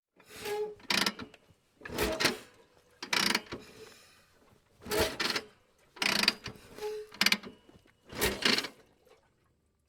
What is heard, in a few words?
metal gear shifting